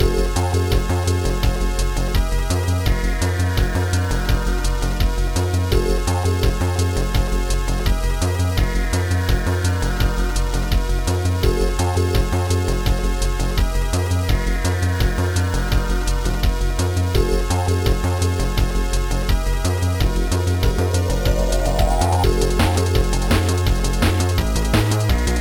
noise real poppy, chiptune

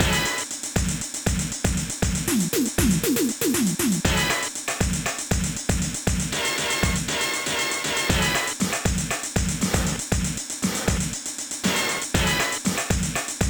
Funk, Dynamic Drums

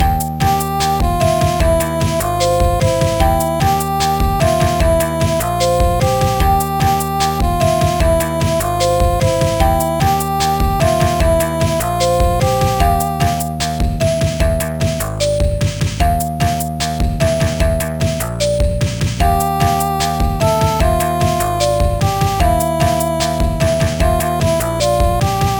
harmonica

